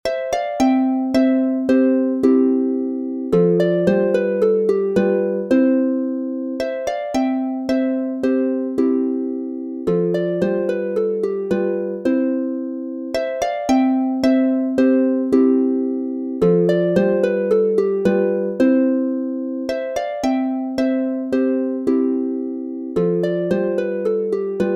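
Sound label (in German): Tanz C Dur 3 stimmig instrumental, Harfe; Notenbuch fuer W. A. Mozart; Schwaben Tanz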